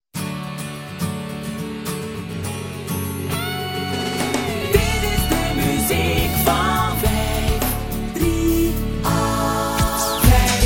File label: Radio Jingles; Radio 538